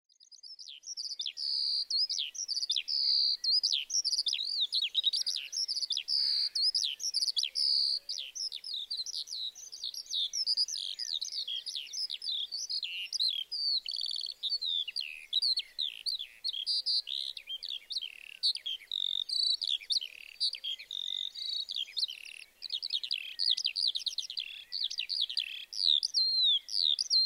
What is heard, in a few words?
skylark